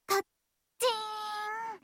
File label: ka-ching